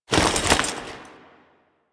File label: Foley Weapon Swap; Warframe; Kahl